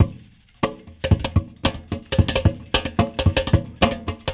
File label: Pot Percussion, Percussion Loops; Ambient Grooves